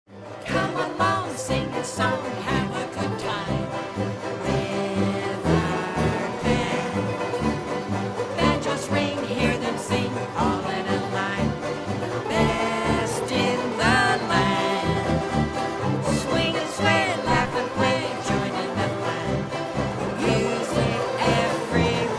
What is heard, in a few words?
polka, banjo band